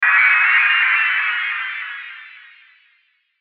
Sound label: Sweeper Warble Descend; Production Element Imaging Element Accent Transition; Warble Sound Effects